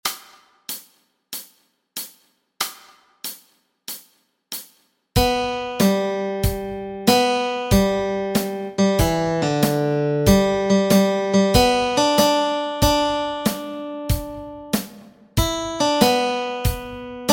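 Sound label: melody, Easy Guitar and Ukulele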